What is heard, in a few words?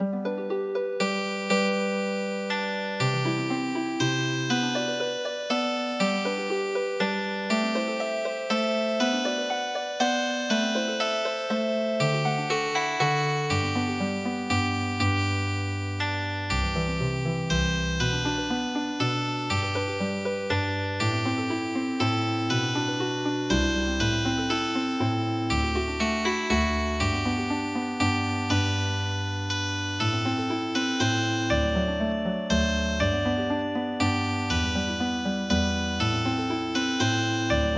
Healing Harp, traditional; midi